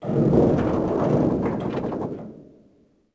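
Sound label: creak metal large; Star Wars Obi Wan; XBOX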